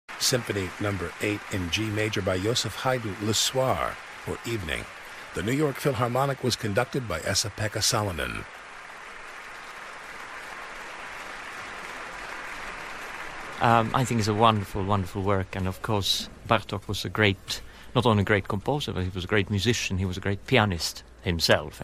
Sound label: Piano Concerto; Bartok; Mandarin Suite; Avery Fisher Hall